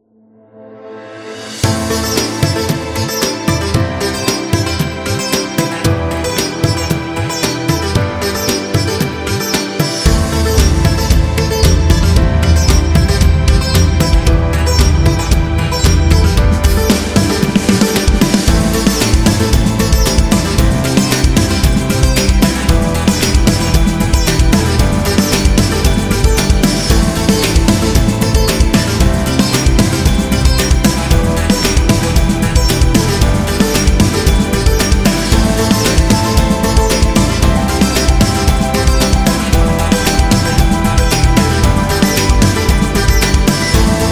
Indian Fusion

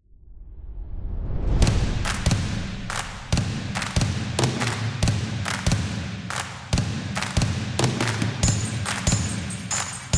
The Sports Cinematic Drums

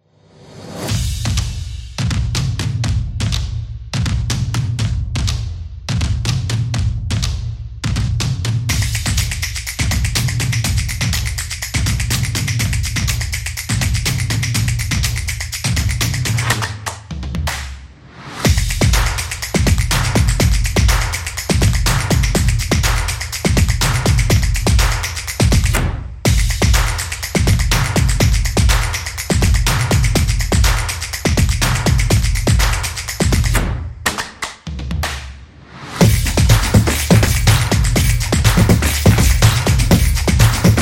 Intro Fun

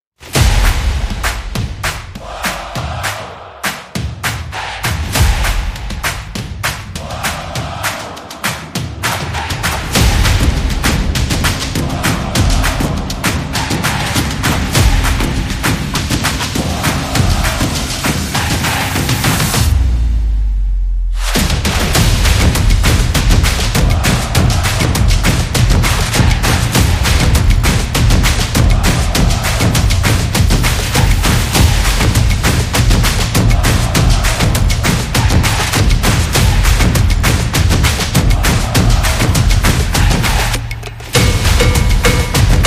Stomp the Beat

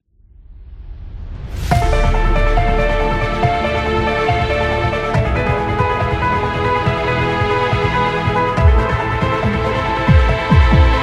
Dramatic Epic

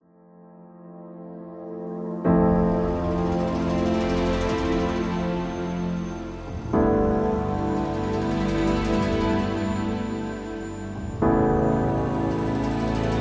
The Documentary

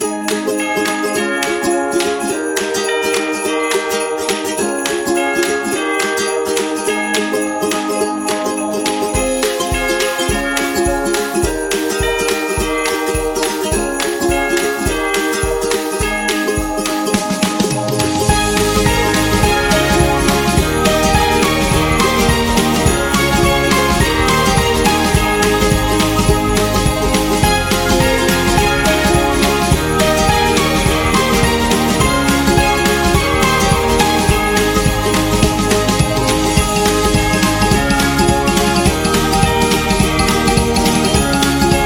Merry Christmas

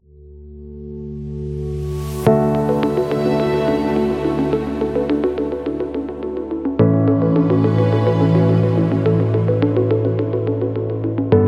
Documentary Technology

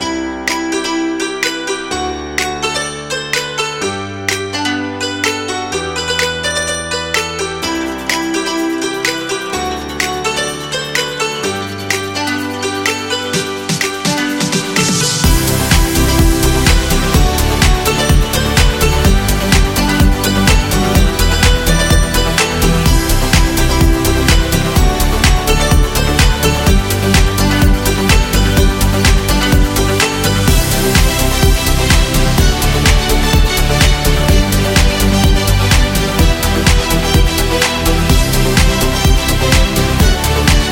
Chinese New Year

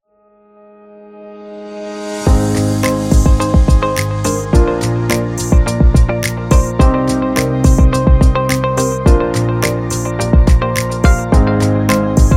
Medicine Ambient

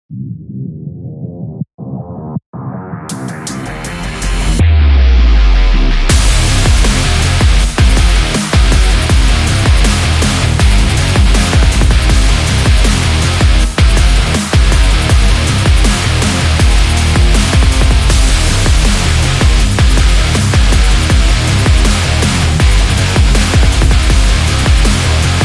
Active Sport

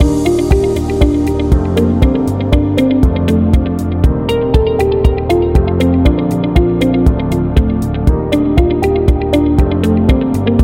Real Estate Loop

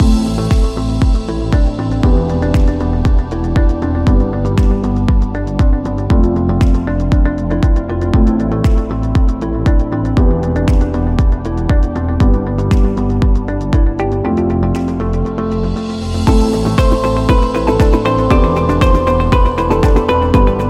Ambient Loop

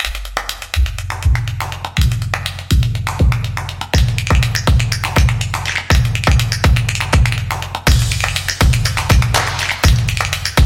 A Percussion Energy

